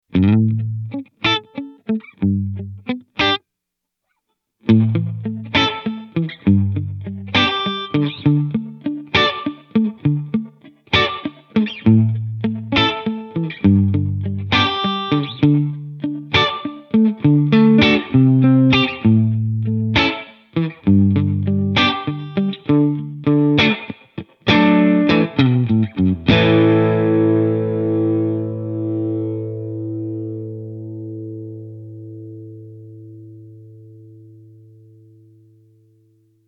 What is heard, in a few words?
Spring Overdriven Short, reverb